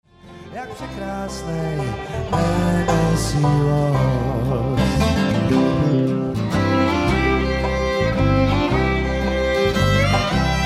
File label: Waltz